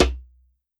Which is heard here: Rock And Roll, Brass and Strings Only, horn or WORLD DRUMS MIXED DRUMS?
WORLD DRUMS MIXED DRUMS